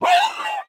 flinch; Rust

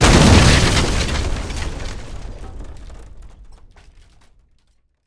exp house impact; Explosions; Call of Duty Modern Warfare

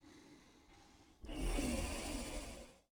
sleeping; Rust

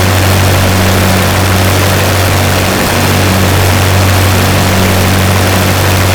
Aircrafts Engines Packard Merlin Prop; DCS

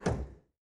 stick roll lim, aircraft; DCS

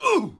Knight Hit Vox; Civ4 beyond sword

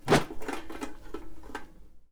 lantern attack; Rust

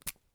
injector cap; item injector; Escape from Tarkov

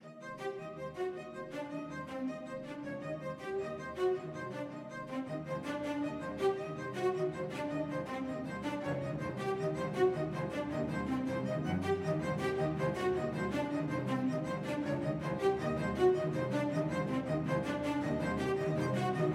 music interactive; World of Tanks

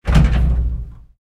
turret klank, tanks

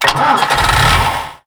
Vehicle Engines; Red Orchestra Ostfront 41-45

